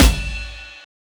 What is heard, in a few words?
tom full roots